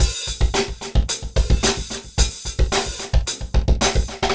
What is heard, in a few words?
BPM110 FX; Effect Loop